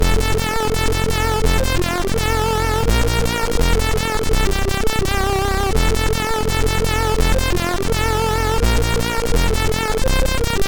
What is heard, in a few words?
Jingle Bells sid MOS6581R2; Selles Ward